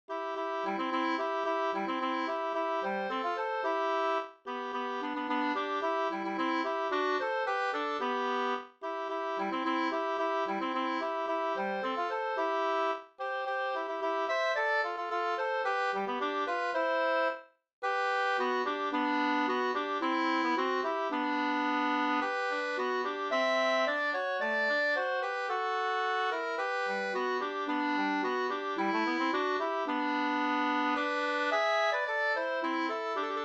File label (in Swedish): folkdans, kadrilj Cdur; Sonderborgskadrilj